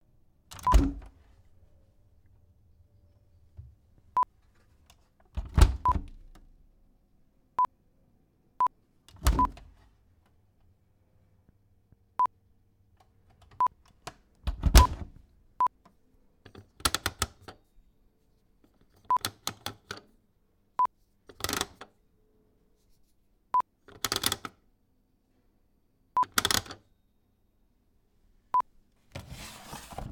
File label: Washing Machine Door and Buttons